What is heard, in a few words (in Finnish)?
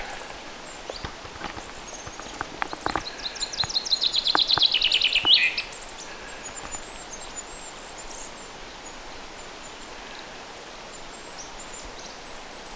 hippiäisen iloista ääntelyä, peipon erikoisia rullaavia ääniä ym.